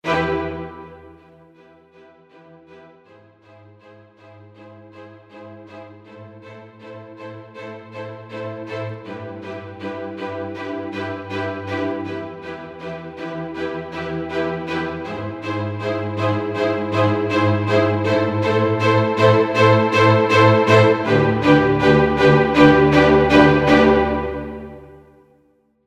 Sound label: String Ensemble; Native Instruments Symphony Series